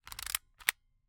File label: Camera, Kodak Extramax, pocket, 110 film, plastic, metal, advance film, slide lever; Eiravaein Works; Vaeyan IV